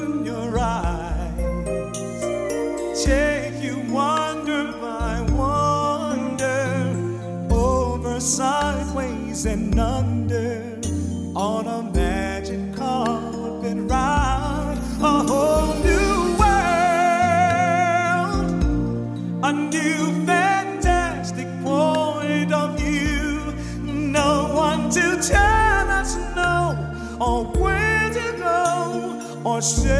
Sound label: pop